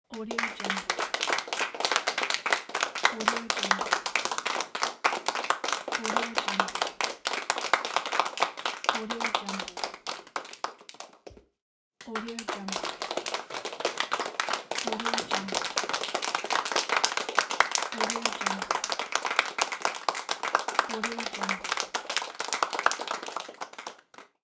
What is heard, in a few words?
applause small crowd indoor